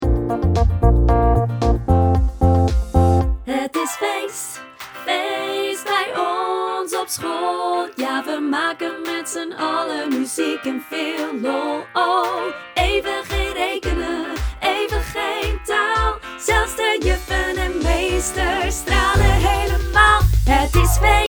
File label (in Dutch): Dans en instrumenten